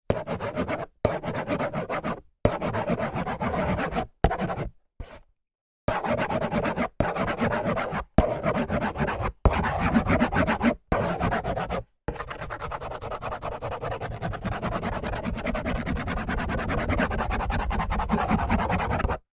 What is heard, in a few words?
toy magnetic drawing board drawing colouring